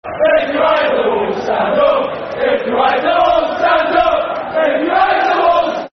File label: Stand Up If You Hate The Wolves, Football Chants; West Bromwich Albion Wba; fanchants